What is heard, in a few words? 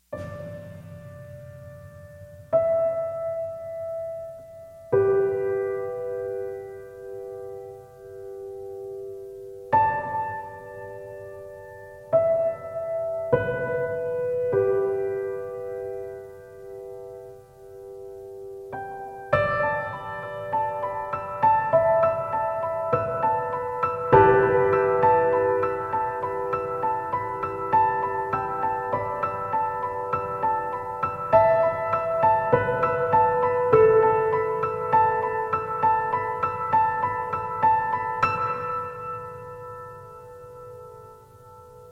piano